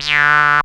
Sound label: SYN TON; E-Lab; X Static Goldmine 2; akai